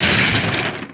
Smash

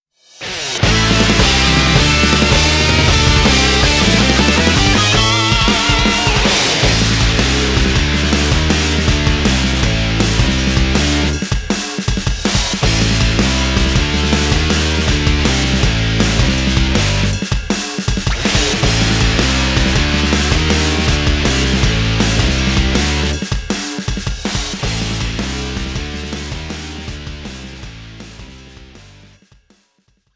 Victory Jubilee Beat Mix; Shin Sangokumusou 6 Dynasty Warriors 7

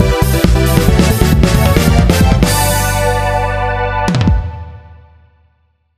Jingle; Sudoku; PSP

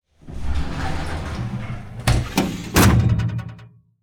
Tortures Morgue Box; This Is the Police 2

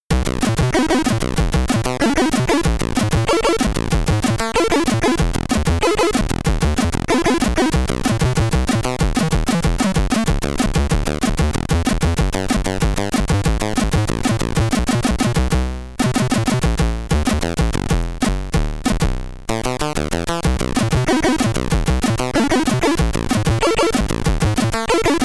BGM (SID Stereo)

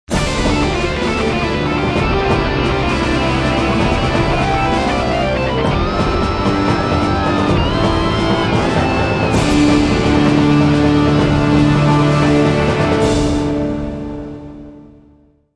Modern Agitated; Civilization Revolution; Xbox

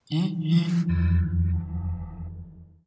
whale

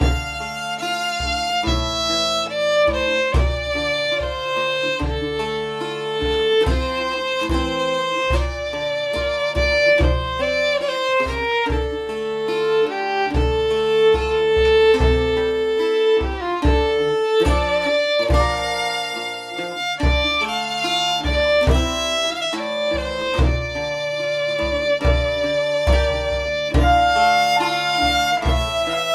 Orchestra Ambience; Assassin's Creed III

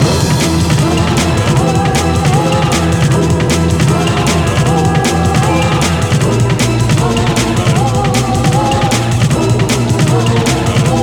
Music Race; Crash Tag Team Racing

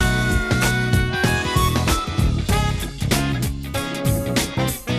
horiz scroller

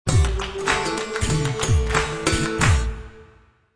Mali Music Short; Civilization Revolution

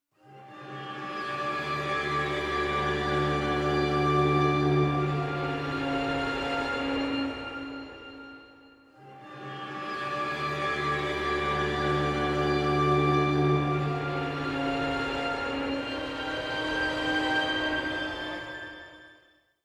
Monster Hunting; Skellige

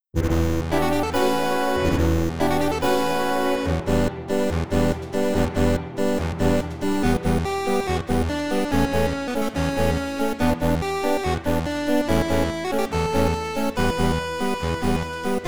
8bit; Hyrule Warriors Definitive Edition

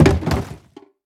Crash Objects Plastic; gamerip; Car Simulator 2; android

